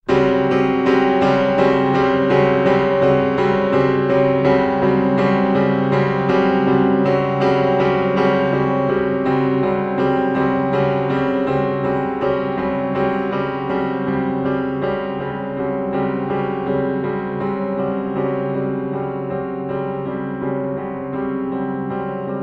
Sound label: Klavierstuck